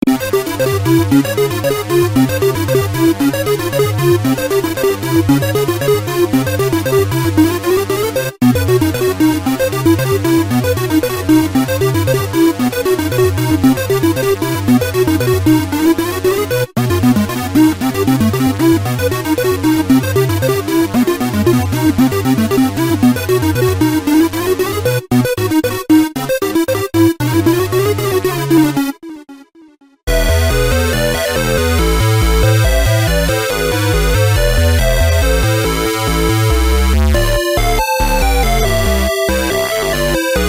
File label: arcade